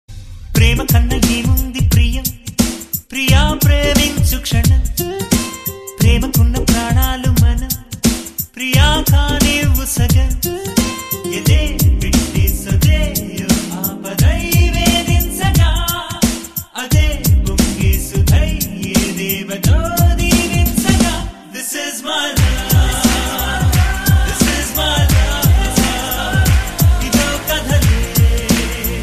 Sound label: Cute Song